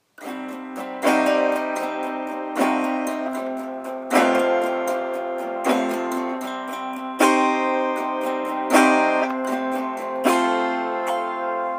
A Howl [singing][song about the prairies][Canadian][acoustic] autoharp; original song